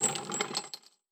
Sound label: Chain; Fantasy Sounds Bundle; Craft